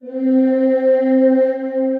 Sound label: Glowing Choir Female; Voices